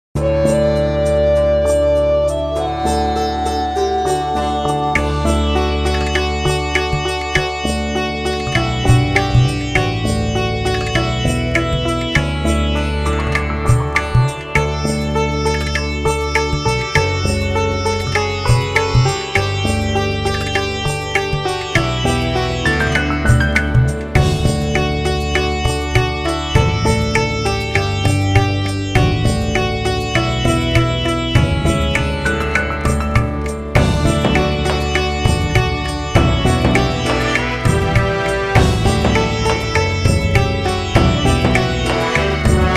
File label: Sitar Version; Jana Gana Mana